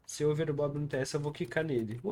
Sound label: kick